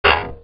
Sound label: boink